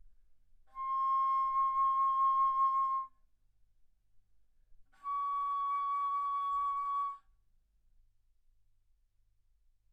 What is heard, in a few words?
Bass Flute C6 Db6; ff